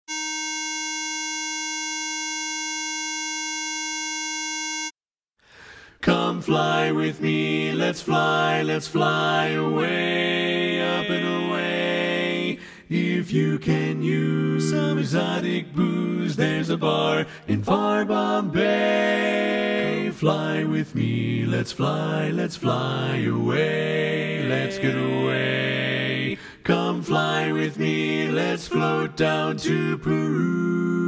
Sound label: Bass